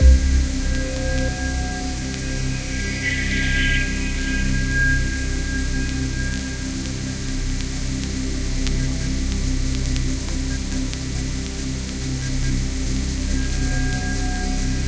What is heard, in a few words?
ambience; Mirror's Edge (2008)